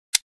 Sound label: Empty Gun Click